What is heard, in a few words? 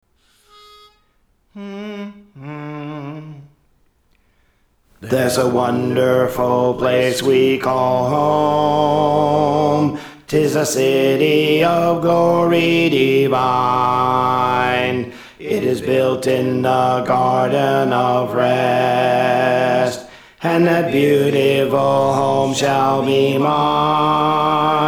Alto; Praise For The Lord Training